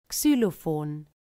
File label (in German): xylofon